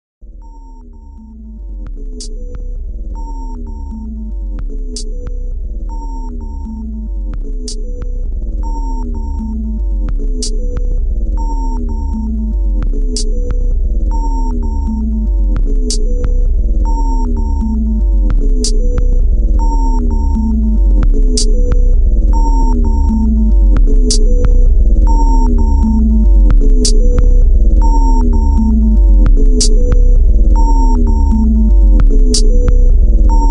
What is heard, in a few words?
Cold Alien Workshop; Noisesculptor